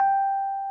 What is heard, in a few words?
RHODES G4; Sonic Reality - Vintage Timetraveler